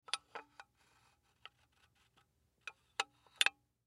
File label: Door Lock Slide, Door Lock Slide Lock; Slow Quiet; Household; Objects; Blastwave